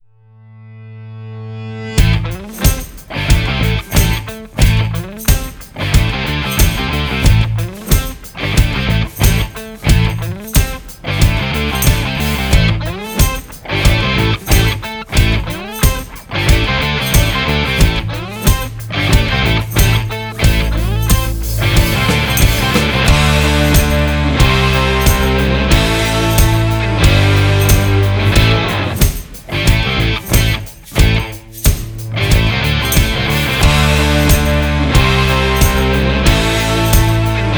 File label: rock